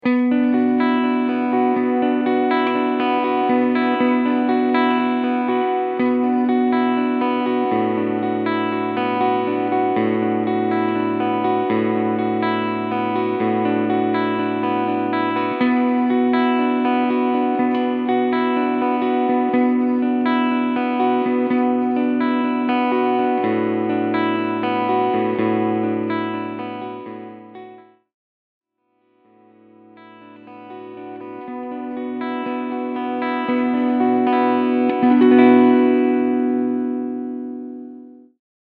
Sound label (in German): Sterling Sabre beide PUs Clean Sound